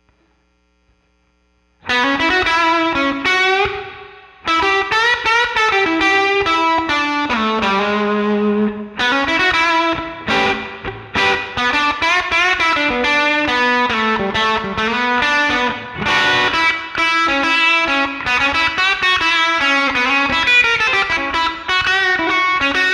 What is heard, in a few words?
paf p90 a